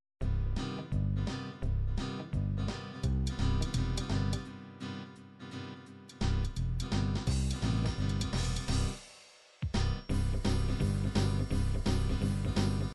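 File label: pop shuffle, midi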